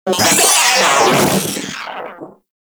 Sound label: Moa Vocal Death; Warframe